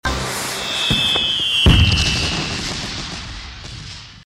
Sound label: Fireworks